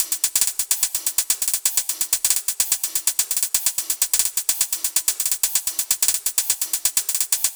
Drum Loop Top 127

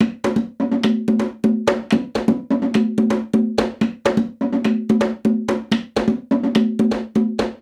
126 Percussion Loop

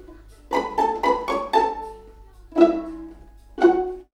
A Strings, 116

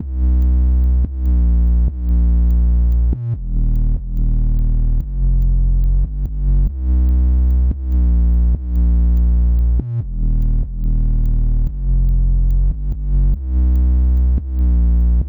BPM72, 808

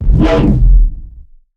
Dubstep Bass One Shot F; Vital

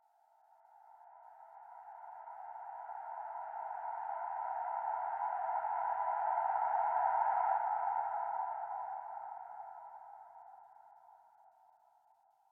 FX Rev Gm 122

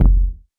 kick oneshot moody

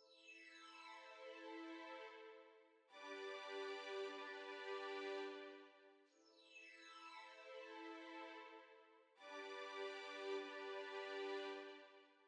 153bpm String

